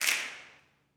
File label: SNAPS, Gospel; Vocal Planet Vol1 Gospel; CLAPS+SNAP